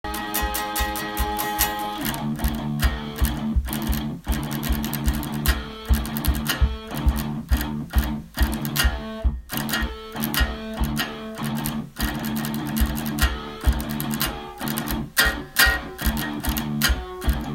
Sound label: Orchestral djent